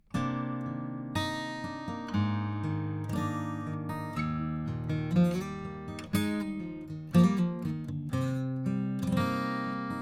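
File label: acoustic guitar; peluso67; rca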